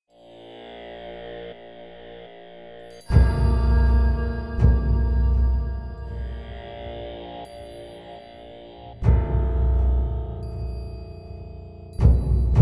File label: Suspense; Vendetta Curse of Raven's Cry